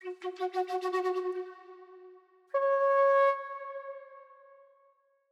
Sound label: 146 BPM D# Min Flute; Loop Stems; Cymatics Gems Vol 8; Gems Free Teaser Pack; Mirrors